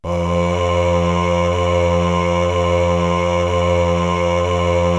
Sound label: cho f1, Choir, KORG DSS-1; Retro Sampler